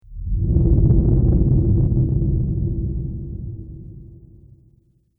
Spaceship Soft Passing Rumble, spacecraft sound effects